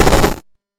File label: hammer slam; Weapons; freepixel